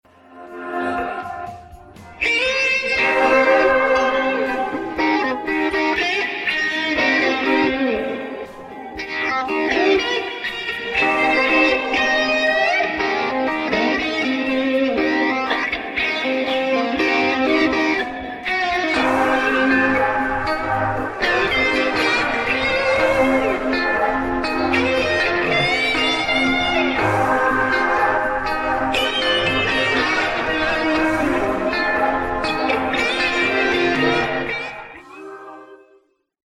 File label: modulated reverb first solo with guitar fills